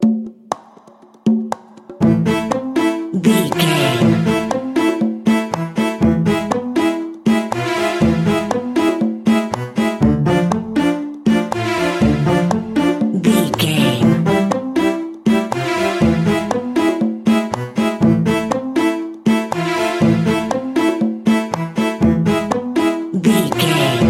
middle east ambience alt, An exotic and colorful piece of World music. Groovy, powerful, exotic and wild